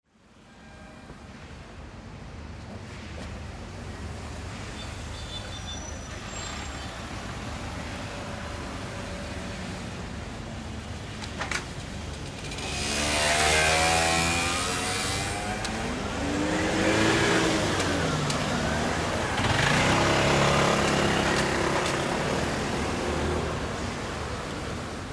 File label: Surround Town Traffic Junction, Light to medium; Spanish town junction; Cars, mopeds, motorcycl; Traffic And Car Sound Effects